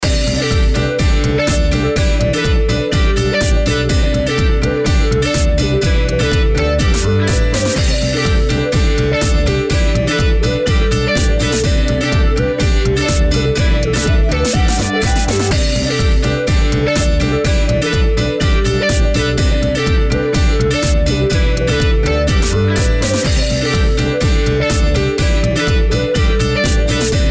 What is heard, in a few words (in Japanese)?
ジングル 錬成、輝き BPM124